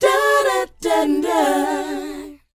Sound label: DOWOP A, Vocal R&B DOO WOP; ILIO; Vocal Planet